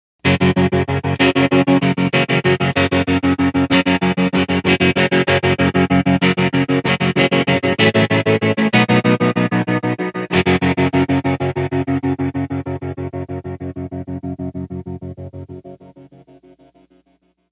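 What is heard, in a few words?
80s photo, Strymon Flint, Guitars; It’s Now Soon.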